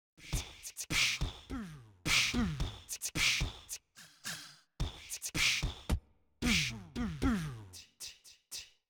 Perc Beatbox; Simmons SD1200

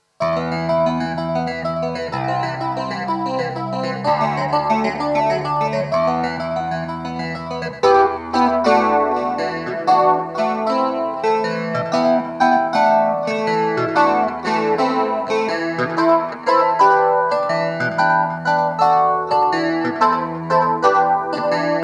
Hohner Clavinet D6 Flanger Echo

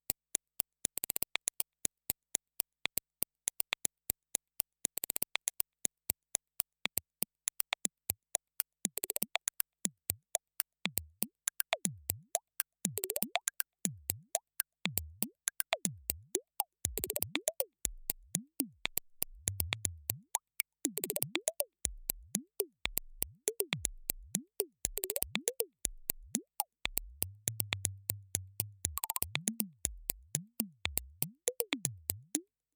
HP Filter

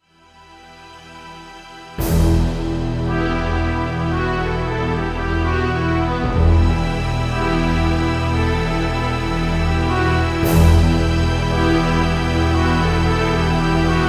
K2661 Orchester Board

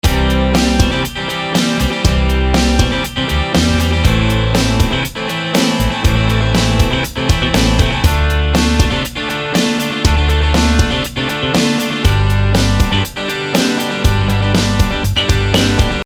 VG2 Brit Pop

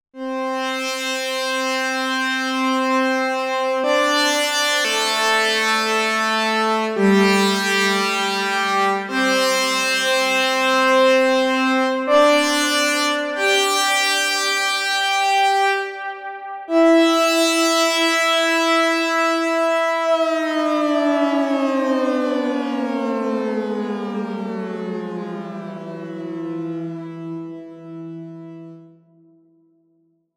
Solo with Novation PEAK

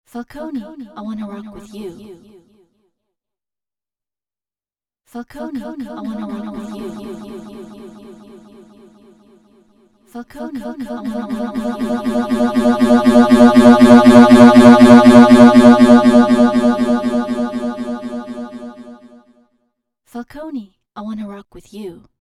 Delay

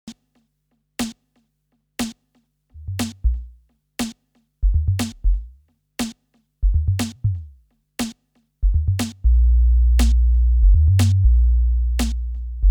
TR6S LoFi HipHop

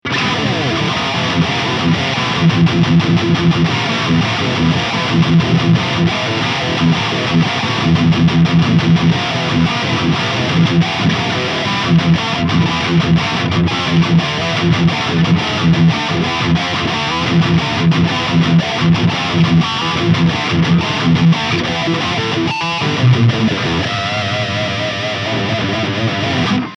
Engl E670FE Lead

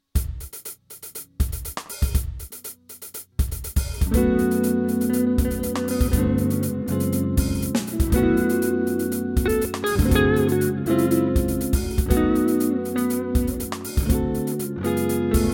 Jazz; Mooer GE 150

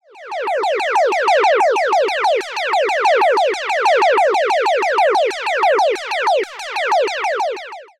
AudioThing Lines Stereo; Impression

